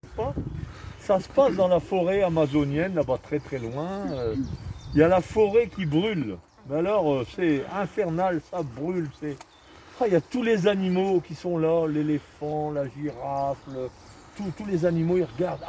colibri

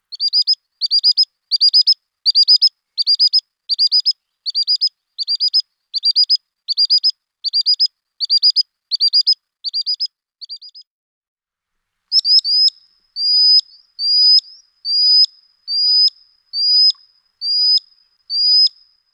Chevalier guignette; sonotheque ornithologie

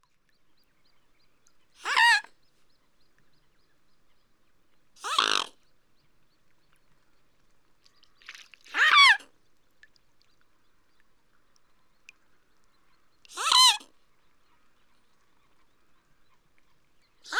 cygne tuberculé; sonotheque ornithologie

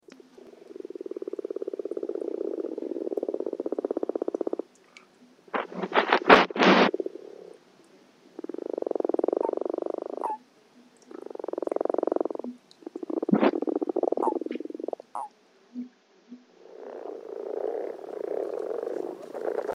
Grenouille rousse; Chant de la grenouille rousse (Rana temporaria)

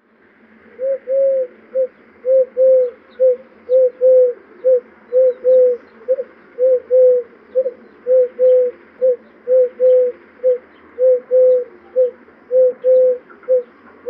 Tourterelle turque; sonotheque ornithologie

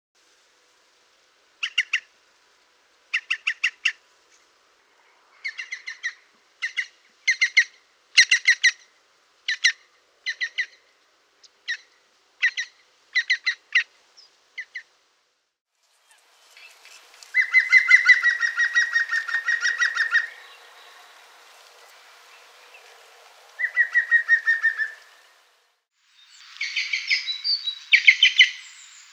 Pic vert; sonotheque ornithologie

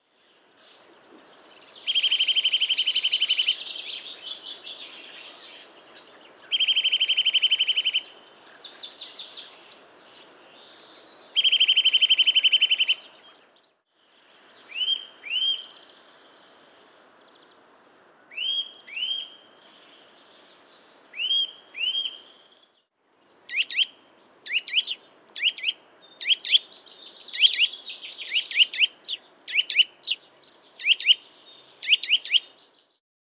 Sittelle torchepot; sonotheque ornithologie

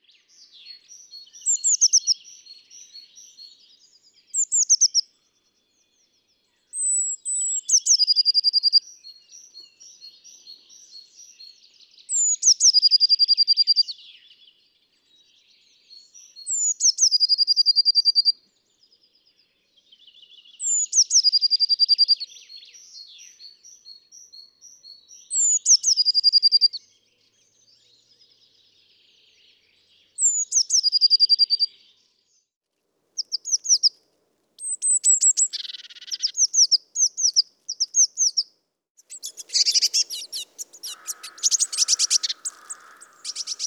Mésange bleue

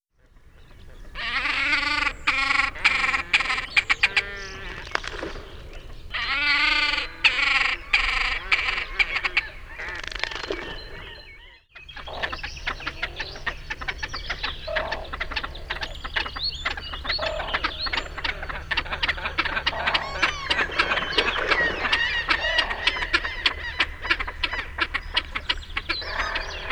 grèbe huppé

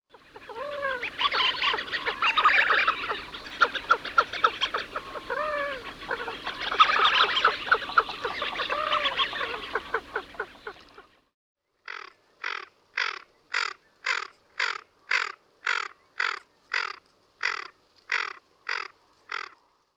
Fuligule morillon; sonotheque ornithologie

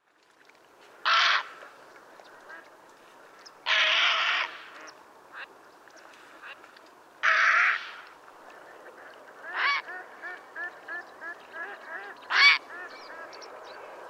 Héron cendré; sonotheque ornithologie

